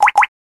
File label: double Beep